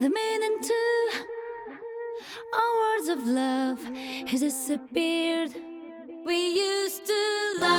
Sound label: vocal loop, acapellas